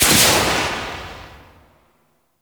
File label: Swarm Missile Launcher Fire 2D Tail; Warframe